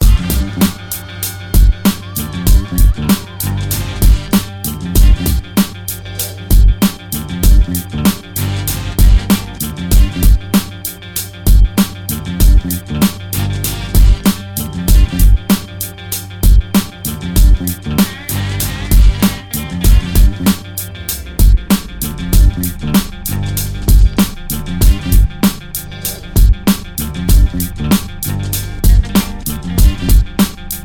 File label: beat